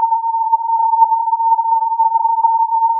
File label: filtered input